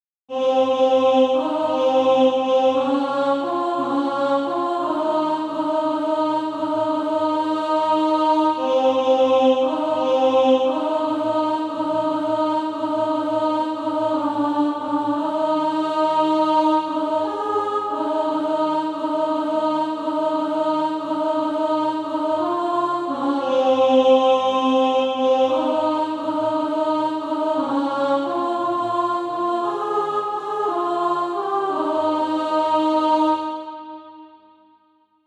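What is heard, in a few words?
alto, SATB Practise Music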